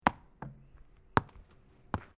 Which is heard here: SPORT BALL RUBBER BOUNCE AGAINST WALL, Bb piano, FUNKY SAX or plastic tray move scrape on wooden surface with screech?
SPORT BALL RUBBER BOUNCE AGAINST WALL